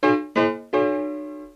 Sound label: piano